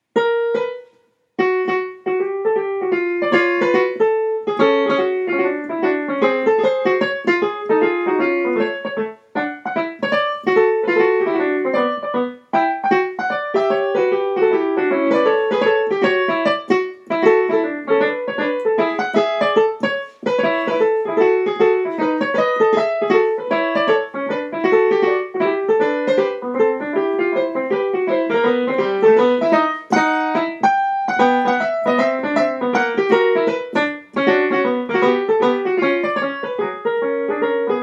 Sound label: fugue piano